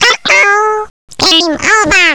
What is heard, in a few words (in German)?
Ende lang; Jump Zampoli